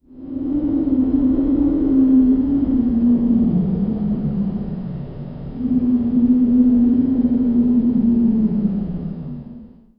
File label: haunted house ambience